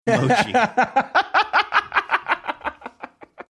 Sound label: having good laugh